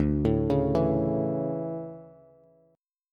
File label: D Major 7th Suspended 2nd, Bass; arpeggiated; JGuitar